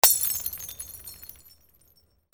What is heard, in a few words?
BREAKING